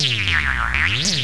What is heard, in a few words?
boingin